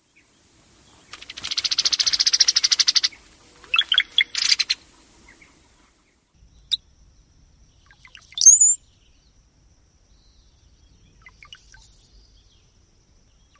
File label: cowbird; Spring thru Summer